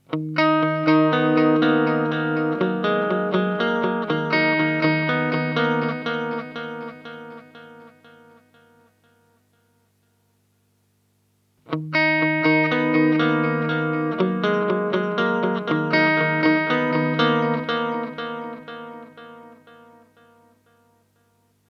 Boss SDE-3000D filter aus und an

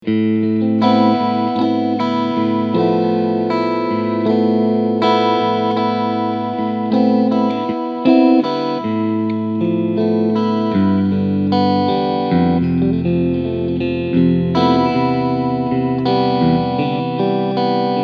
Harley Benton ST 20HSS Cleansound Hals und mittlerer Pickup

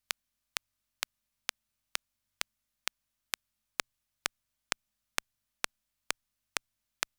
Metronom ohne dann mit AC

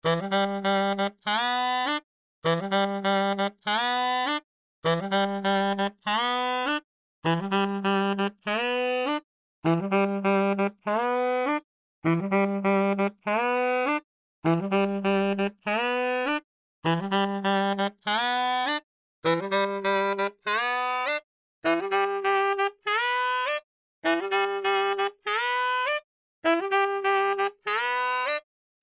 formanten

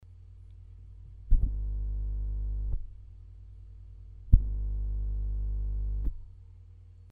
behringer Bv4038 brummen

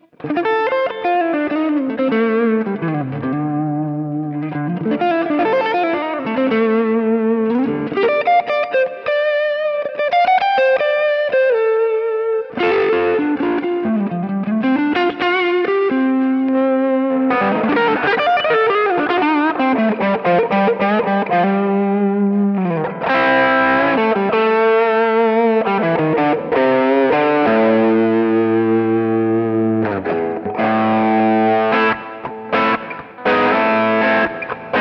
Spruce Goose Overdrive Lift 1 Treble Bass 3 Uhr

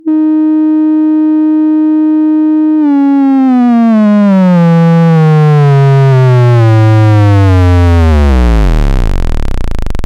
Bastl Bestie Oszillation

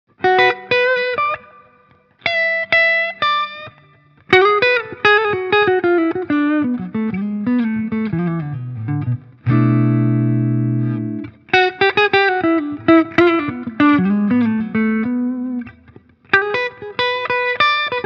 Harley Benton HP 42 Signature Hals clean

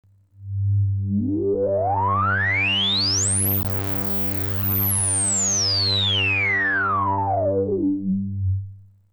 resonanz; diva

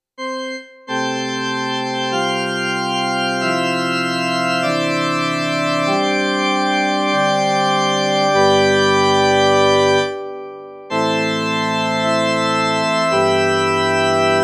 CN35 Orgel

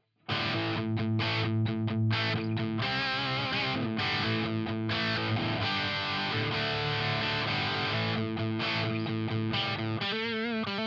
Harley Benton CST 24HB dist steg

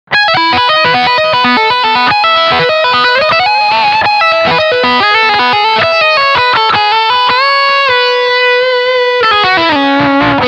Steg PU Leadsound

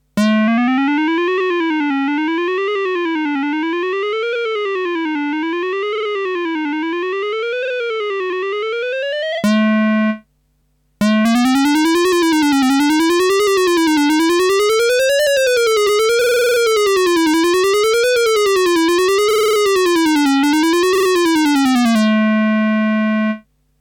Teisco S100P SYNTHE SOUND mit Glissando Mode C

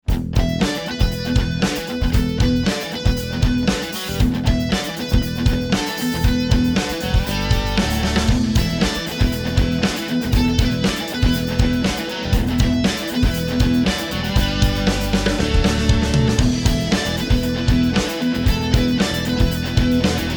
Schlagzeug Testsong; qsc touchmix 30

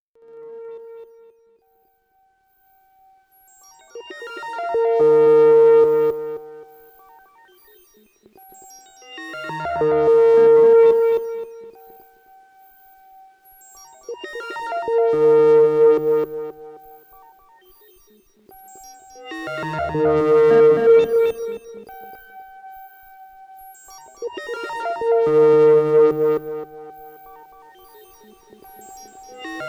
Kosmische Arpeggios

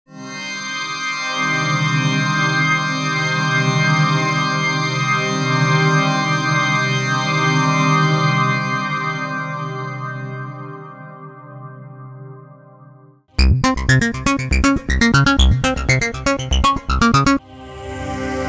Werkspresets

